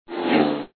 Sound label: jump